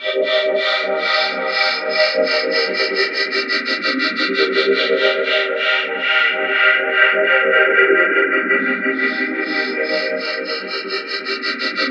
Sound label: BELLSONIC; East West - Twisted Textures-BT CD3